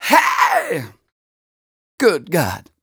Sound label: m 130 mel d-flat, Vocals